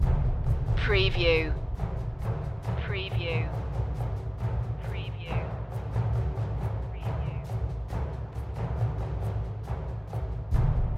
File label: perc loop